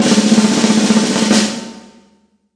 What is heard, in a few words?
ambient roll, snares; single hits